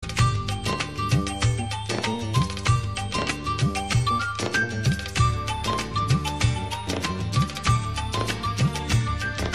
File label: Soothing